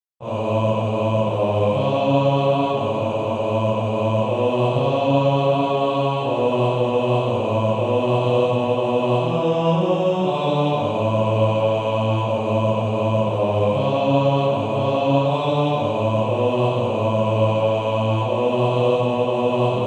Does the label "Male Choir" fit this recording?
Yes